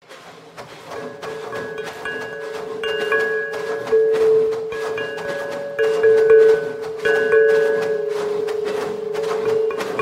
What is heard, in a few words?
Pulsing